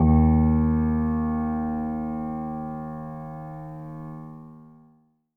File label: MONO, Acoustic Piano